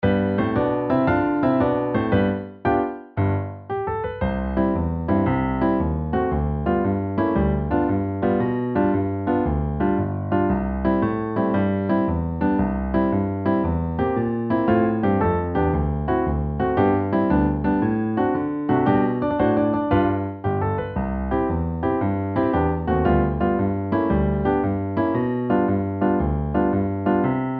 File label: bones accomp only